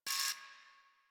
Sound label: Camera Move Loop; Warframe; Veilbreaker; Quests; Kahl